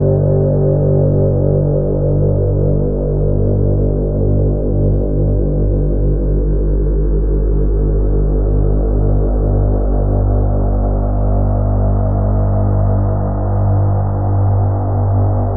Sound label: SHADW DRONE